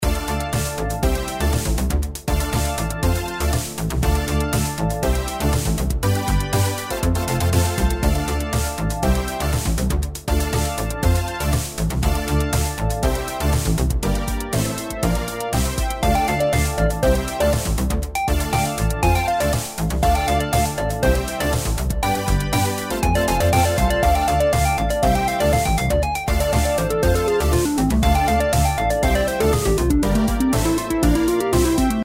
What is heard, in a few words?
reelspin bg; SevenVegas